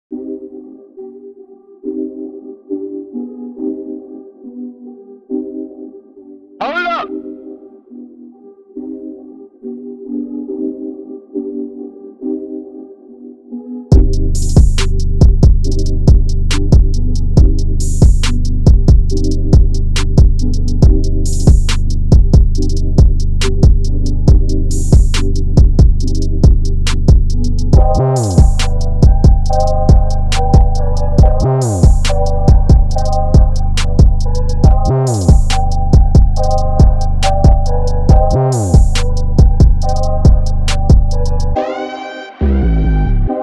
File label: Instrumental